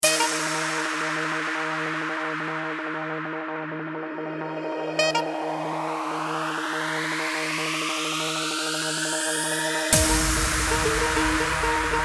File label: INTRO SAX ROCK DOIDO; Tecnofunk